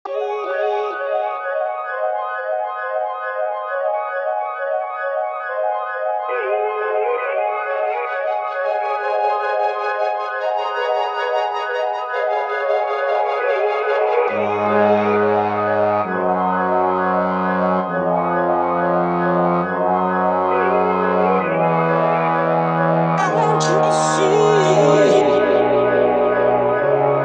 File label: 135 BPM